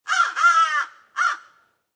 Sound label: Crow Caw Single Long; Lynne Music